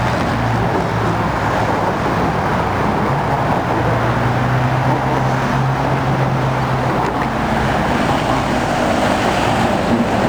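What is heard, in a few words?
Environment interior San Francisco golden gate toll road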